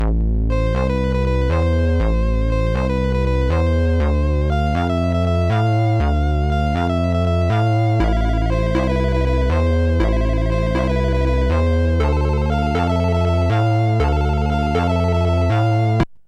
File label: rumba; MusicLine